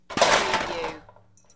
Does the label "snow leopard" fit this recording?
No